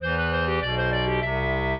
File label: trio, clarinet; Mozart